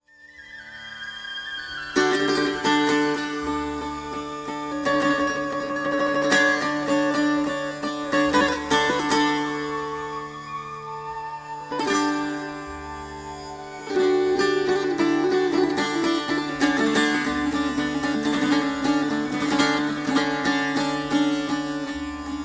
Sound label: Dulcimer Stomp